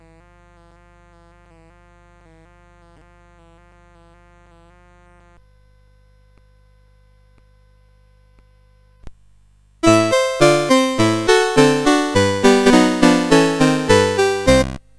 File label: CSG8580R5